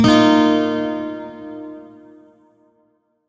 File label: Shawzin Blues Chord; Warframe; Lotus Shawzin